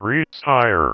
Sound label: Female vocals and robo-vox